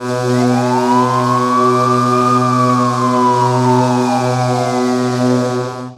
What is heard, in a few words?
Hockey Goal, Hockey Horns & Buzzers; Los Angeles; SFX Kit